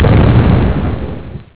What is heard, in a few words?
explosion lrg; outpost